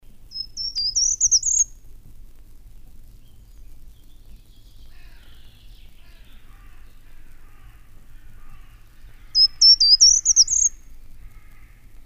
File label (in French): Grimpereau des jardins